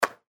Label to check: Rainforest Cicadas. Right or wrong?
Wrong